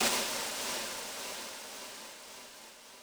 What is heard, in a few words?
snaredrum, Yamaha RM 50